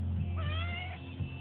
meow

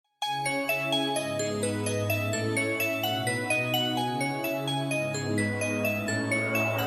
Iphone New Alarm

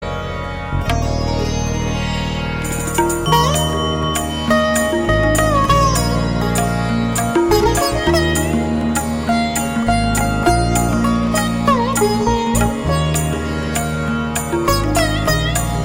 Sitar

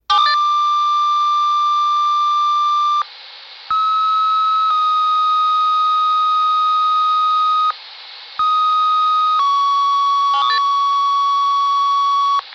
tones

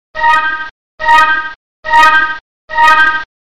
Futuristic Ringtone

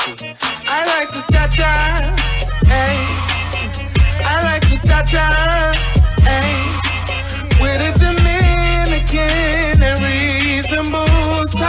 Cha Cha

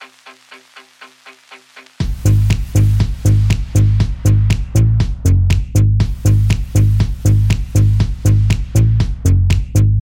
Rock Remix

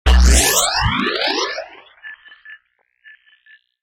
Drunk Sonar

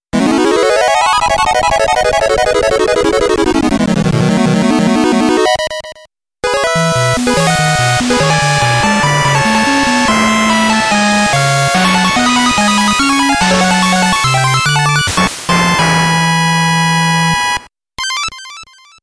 8-bit

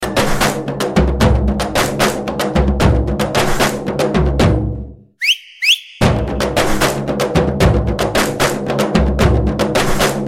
Mass Whistle Beat

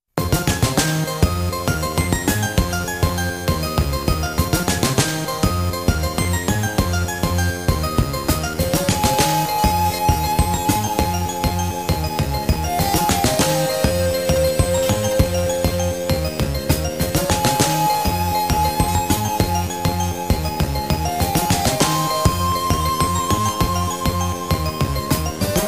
Warning; MG2

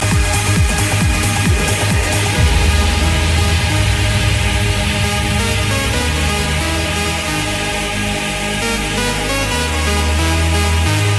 Trance

